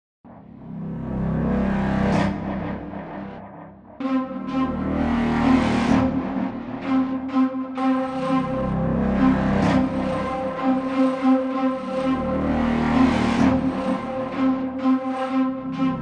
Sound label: Evolved Wood Loop Designer Triplet Performance Combo; VENTO